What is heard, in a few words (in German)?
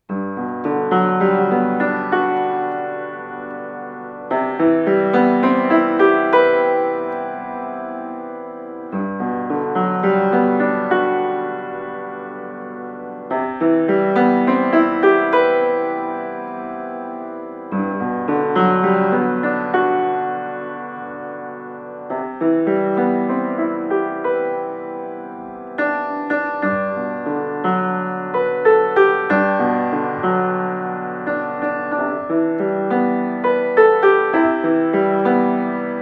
Interpretation Klavier, Jingle Bells Variationen